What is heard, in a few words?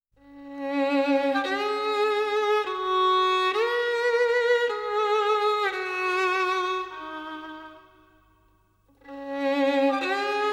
violin solo; Neon Genesis Evangelion; Evangelion S2 Works